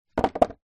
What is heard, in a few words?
sport skateboard down gently